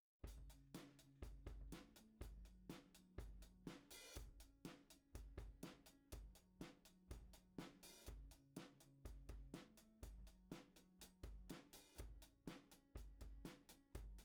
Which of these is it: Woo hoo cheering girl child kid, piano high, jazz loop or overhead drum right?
overhead drum right